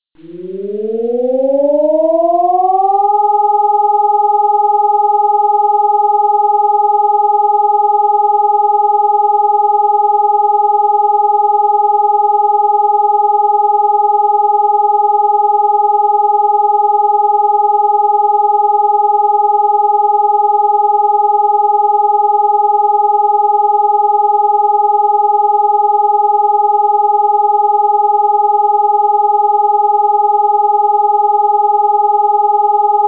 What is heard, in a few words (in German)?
entwarnung, feuerwehr